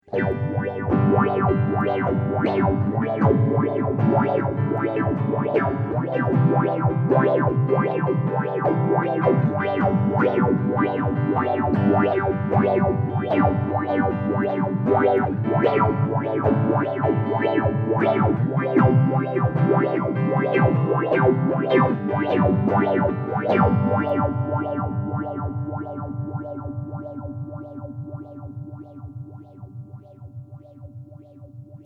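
three quarter depth three quarter feedback guitar, phase shifter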